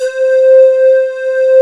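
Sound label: JV square lead, JV-1080; Syntec; Wall of Sounds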